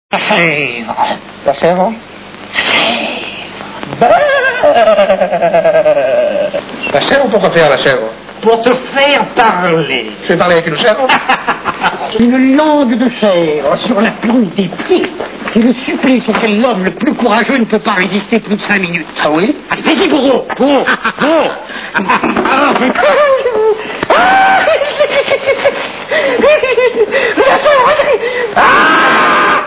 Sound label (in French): chevre